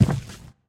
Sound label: Footsteps Ground Civilian Walk; Levan Nadashvili